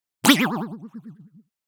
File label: Impact Comic Spring Hit Thin; Hollywood Edge; sound designer's toolkit v2